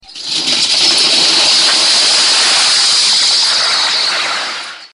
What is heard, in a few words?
Domino Crash